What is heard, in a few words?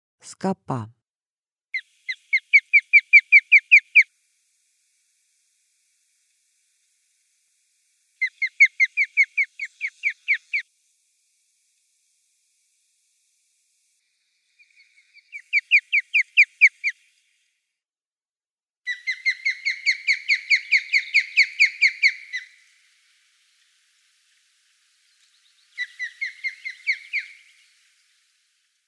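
skopa voice, osprey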